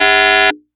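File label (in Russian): предупреждающий громкий пронзительный звон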